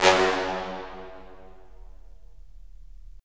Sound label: BRS, Roland LA Composer